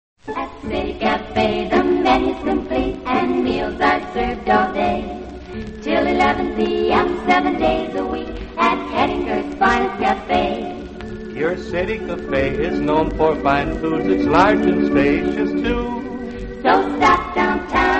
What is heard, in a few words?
radio jingles; hettinger cafe; allied radio artists